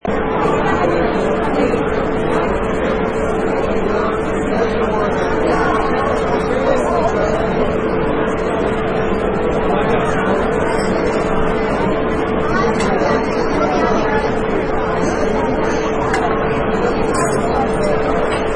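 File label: Huge Banquet Ambience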